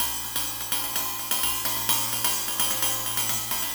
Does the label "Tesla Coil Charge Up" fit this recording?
No